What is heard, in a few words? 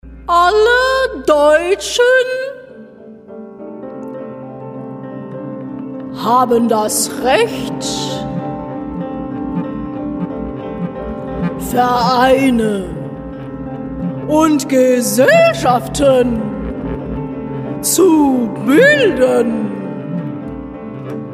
Ringtone